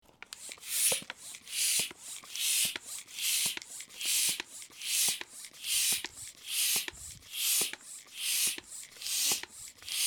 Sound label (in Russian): velonasos kachan